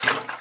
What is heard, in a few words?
throwable slosh; weapons; breadmonster